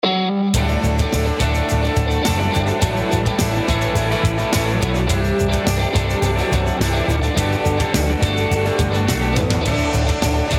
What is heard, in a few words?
softrock